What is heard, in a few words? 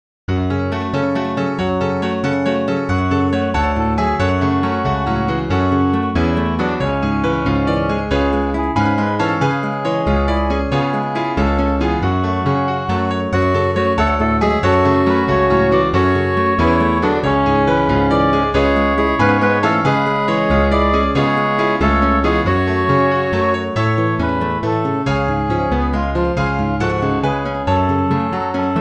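gospel acclamation english lent midi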